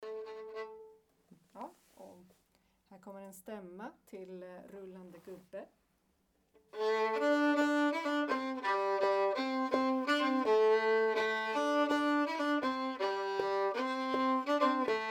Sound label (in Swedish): stämma långdans